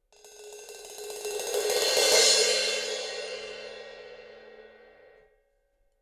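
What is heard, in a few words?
suspended cymbal roll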